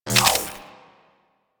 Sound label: Glaive Un Equip; Crp Glaive